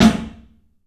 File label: CLUB SNARE; 3D Sound; 3D Drums